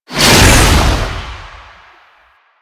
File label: Infested Oni Melee Swing; Warframe